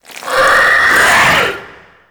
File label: Infest Cast; Warframe; Infestation